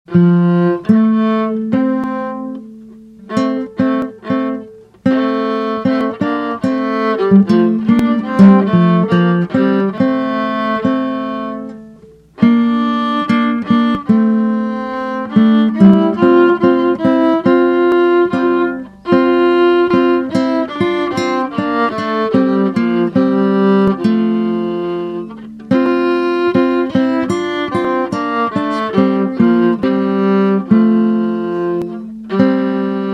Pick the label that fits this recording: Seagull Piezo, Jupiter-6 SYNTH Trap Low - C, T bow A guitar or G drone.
T bow A guitar